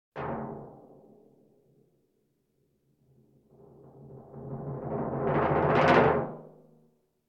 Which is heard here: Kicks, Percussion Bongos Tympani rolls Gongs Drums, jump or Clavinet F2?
Percussion Bongos Tympani rolls Gongs Drums